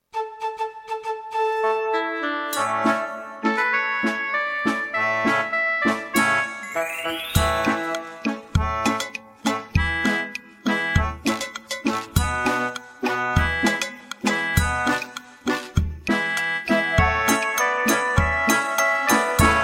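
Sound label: backing track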